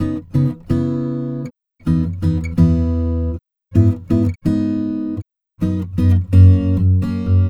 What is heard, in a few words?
Acoustic Latin Guitar 128BPM C#min; Acoustic Latin Guitars; Vengeance; Essential Clubsounds Vol.4; Guitarloops